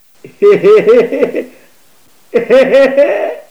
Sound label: jeje